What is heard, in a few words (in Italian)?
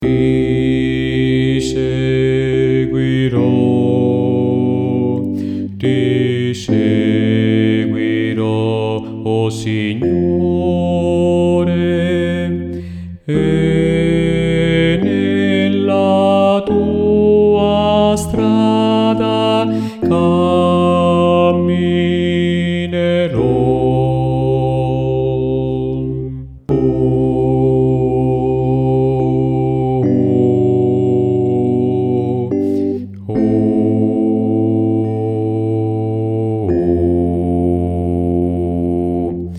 bassi